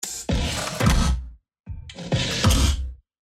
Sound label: FX spaceship door